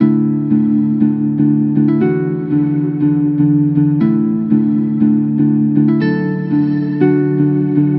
Musical Loop 120 F Minor; Obscured; Ghosthack

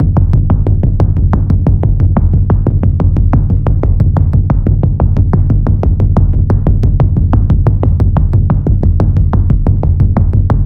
Trailer Pulse A 90 BPM; Technophobe; Ghosthack

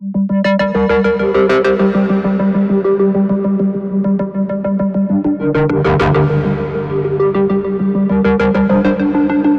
Musical Loop 100 Synth Lead G Minor; Ghosthack

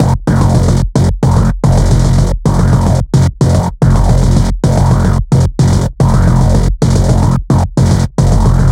Trailer Pulse F 110 BPM; Escape-Hatch; Ghosthack

